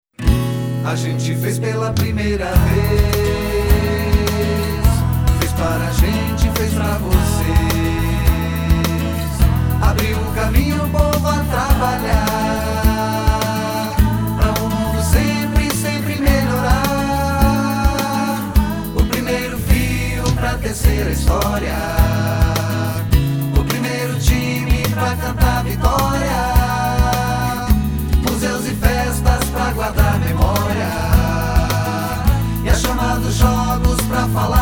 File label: jingle; Brusque Pioneira